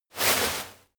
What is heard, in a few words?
step walk graze grass; schritte